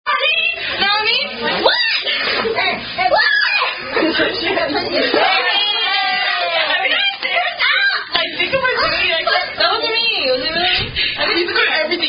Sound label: Signs Indian Non-Drinking Game; Family Thumpers